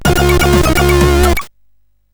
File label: Jumping Jack, CSG8580R5; Andy